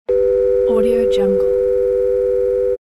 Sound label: dial tone